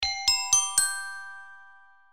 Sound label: Longspan, notifications